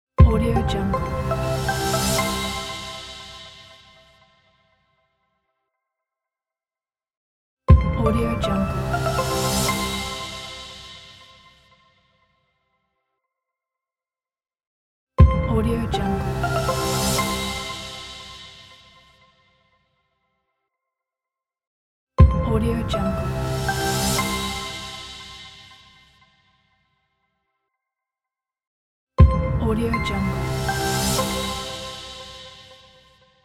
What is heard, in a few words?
logo signal